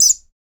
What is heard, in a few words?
PRC Bird Calls; NorthStar - Global Instruments VOL-2